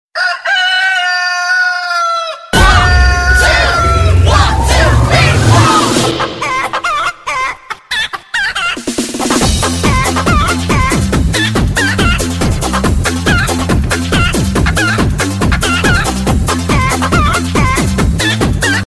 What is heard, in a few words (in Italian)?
Sveglia Gallo